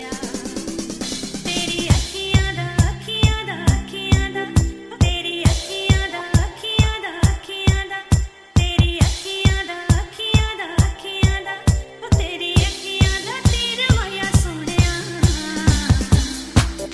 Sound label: Hindi Mix Club